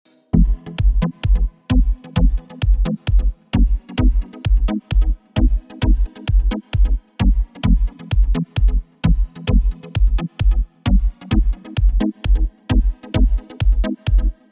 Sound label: aerobic dance 432 hz; 131 BPM